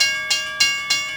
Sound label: train crossing; Soldier Of Fortune II Double Helix; XBOX; ambience